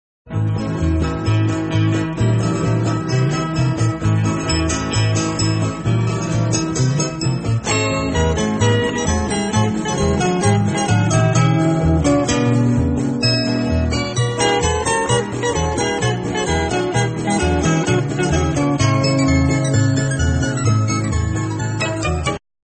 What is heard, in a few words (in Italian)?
Mandolino; lespaul; guitar legend